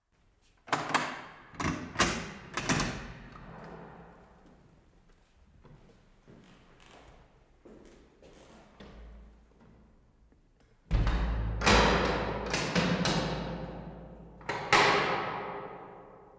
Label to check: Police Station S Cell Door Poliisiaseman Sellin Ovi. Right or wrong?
Right